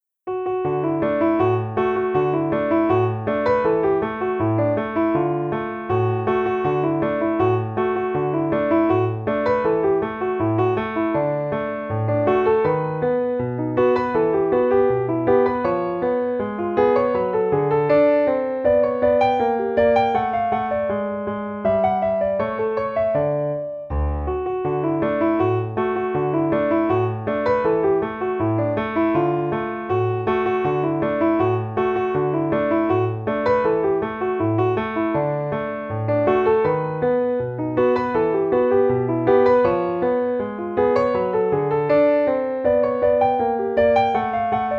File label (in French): rag, Piano seul; Jazz